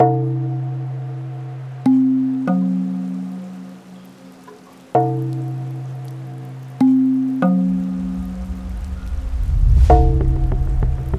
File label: Scary Horror